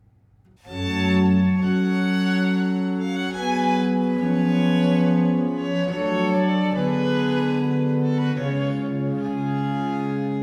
Sonata in G minor, Largo

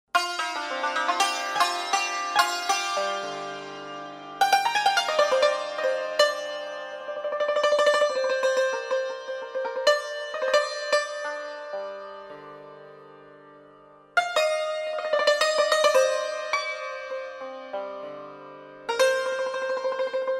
Dashti II Improvisation

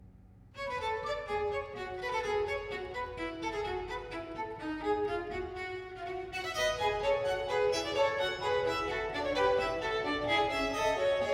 Sonata in G minor, Vivace

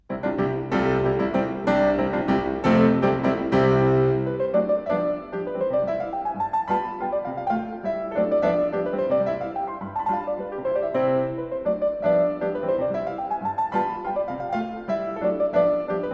Mazurkias, Piano; 4 Mazurkias, Op. 68; The Piano Works, Disc 10

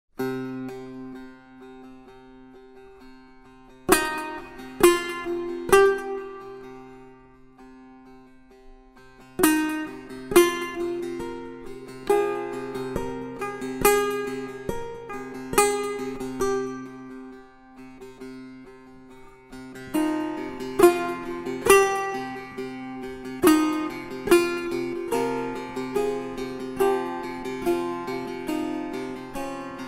Setar

